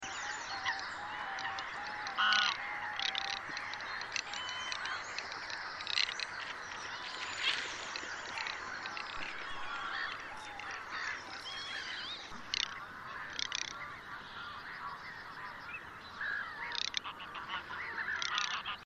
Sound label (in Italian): Marzaiola